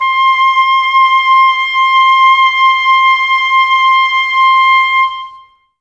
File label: OBOES C, Woodwind; Wood Winds; Oboes Links; Miroslav Vitous; Woodwind Brass Ensemble